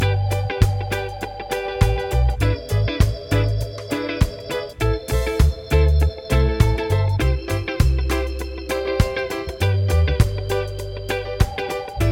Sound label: progs with applied chords